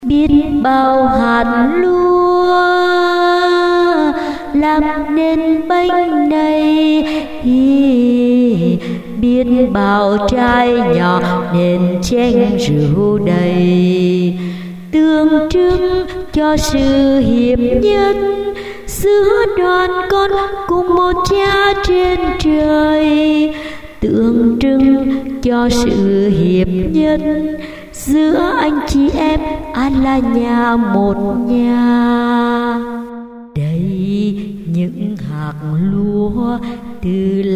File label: tenor